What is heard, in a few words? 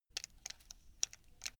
buckle rattle short slow